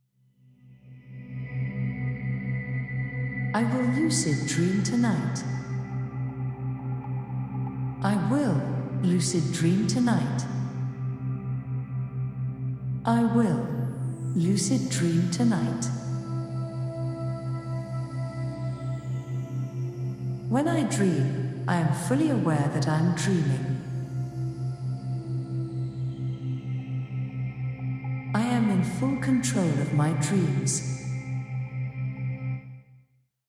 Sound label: Sample Theta In C Lucid